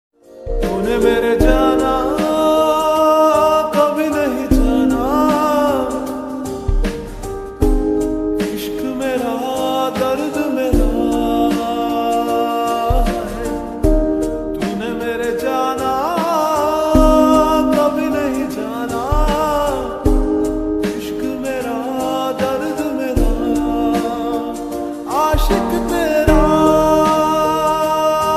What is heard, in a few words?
Lofi